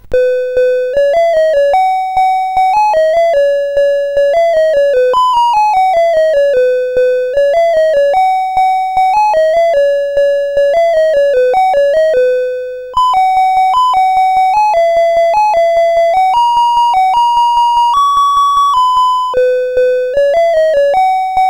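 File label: Mr Wiz, MOS 8580 SID chip music, games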